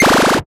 DEATH RAY

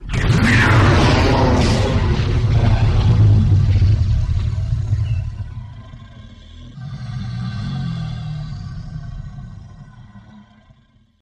Animal Scream Explos, dinosaurs

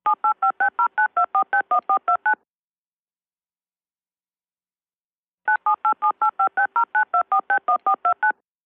Auto Touch Tone Tel Di, futz touch tone dialing

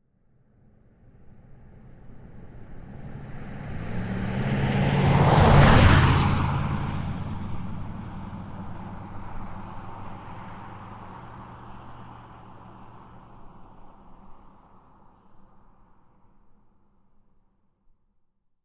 Wagoneer Med By Rev, jeep wagoneer

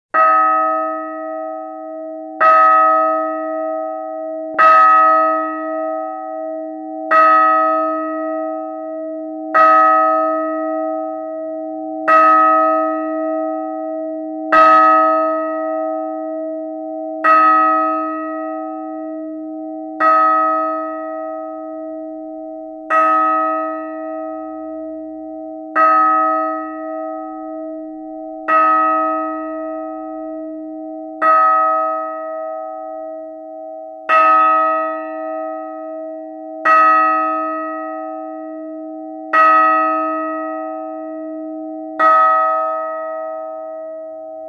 Church Bell 3 Large D3, bell towers

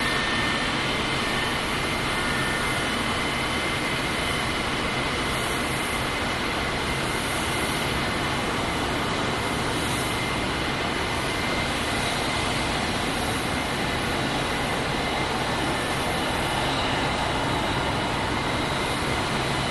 Airport Tarmac Jet En, taxi

